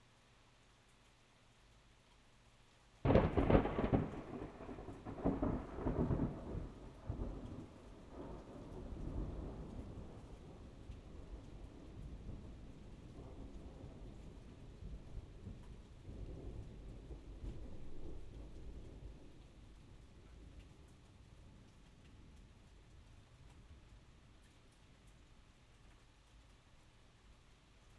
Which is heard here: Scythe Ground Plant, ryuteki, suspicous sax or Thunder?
Thunder